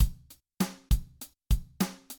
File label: conflict drums